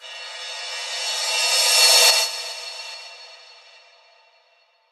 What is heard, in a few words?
Reverse Cym, Cymbals